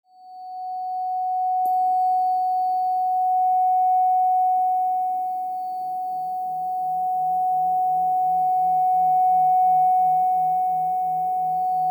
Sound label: 741 Hz Cleansing Detox